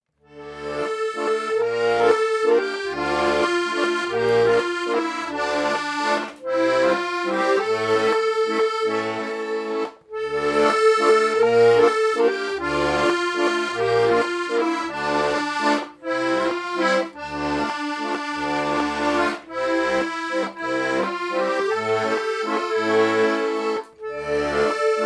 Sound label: Musette